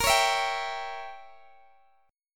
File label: A sharp Diminished 7th, Guitar Open D